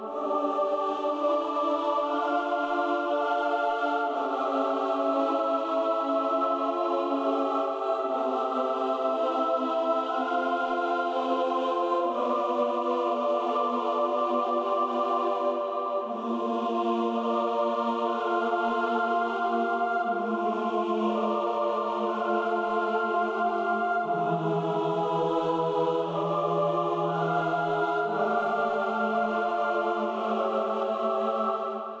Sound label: Battle Page